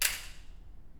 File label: hall impulse; 講堂7